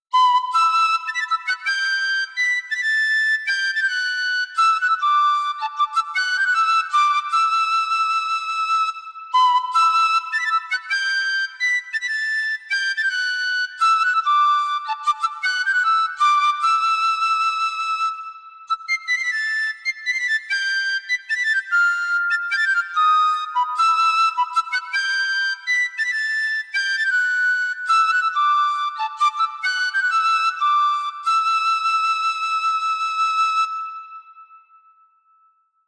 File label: Piccolo; VIVO S10